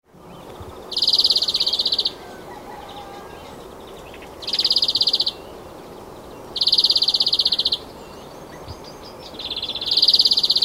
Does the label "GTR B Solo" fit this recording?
No